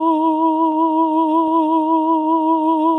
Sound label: ahh E